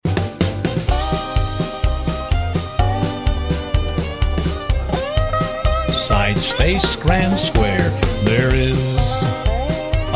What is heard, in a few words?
vocal